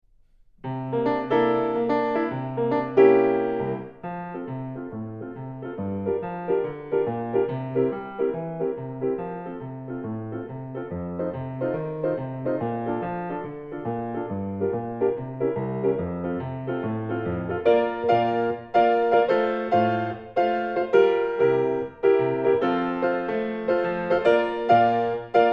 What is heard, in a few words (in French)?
piano